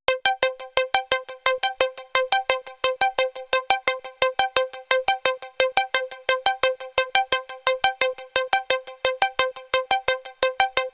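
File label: synth loop liquid arp A minor, 174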